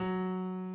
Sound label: key; keySounds